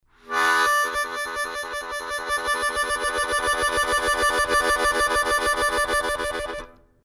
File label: Draw Flutter, harmonica